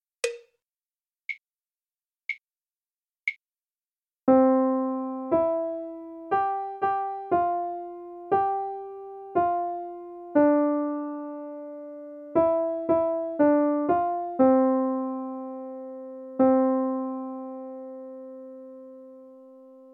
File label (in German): Melodie